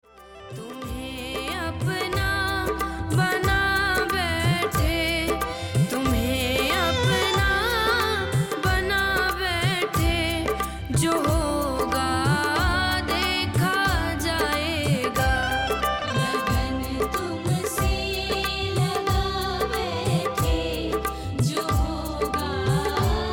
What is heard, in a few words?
Trending Bhajan